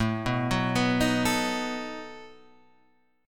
A 6th Suspended 2nd Sharp 11th, guitar; A6sus2#11 Chord {5 2 4 4 4 5} arpeggiated

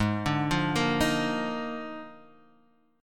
Eb Major 7th Suspended 2nd Suspended 4th Ab

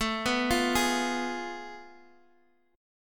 F Diminished A; Fdim/A arpeggiated; JGuitar

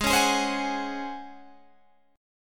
C sharp Major 7th Suspended 4th G sharp

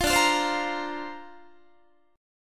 D-Minor 7th Sharp 5th D Guitar, chord; Dm7#5; strummed

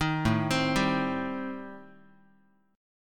A Minor 7th Flat 5th A, Guitar; Am7b5 arpeggiated; 4A,4E,5A,5D,5G#,6C#; 6,5,3,5,x,x

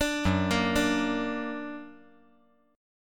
F sharp Augmented F sharp; F#+ arpeggiated; 7,6,6,5

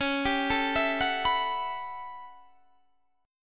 A 13th C sharp, guitar chord

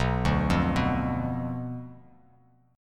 B Diminished B down Bass Standard; Bdim arpeggiated

